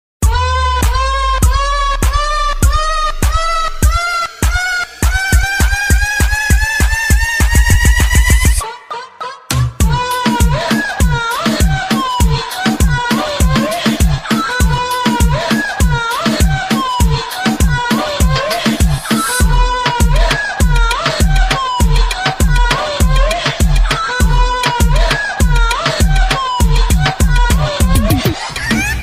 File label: sexy bgm ringtone love ringtone sexy ringtone